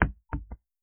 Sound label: ball bounce